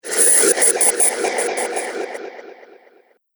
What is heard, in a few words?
Amazing Air Sound, whoosh; Andrew Fleming